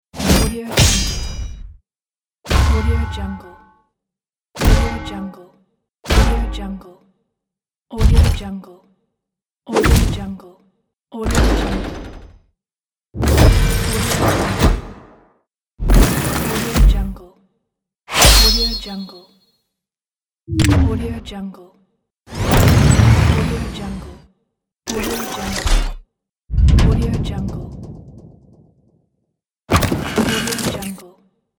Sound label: industrial sfx pack